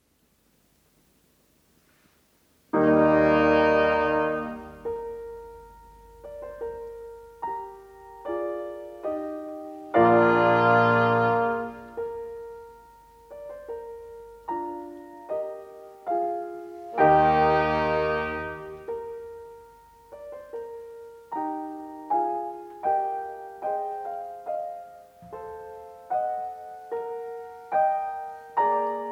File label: Quintet in E flat for Piano and W; Mozart Horn Concertos